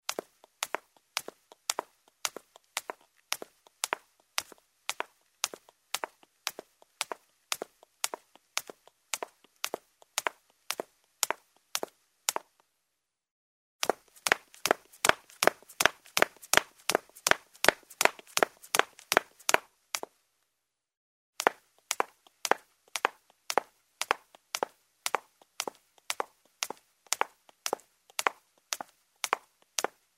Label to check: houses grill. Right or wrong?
Wrong